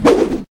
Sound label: fire, smallclaw combat abilities